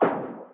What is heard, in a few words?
close settings